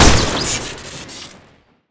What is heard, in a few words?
gold machine gun shoot crit; tf2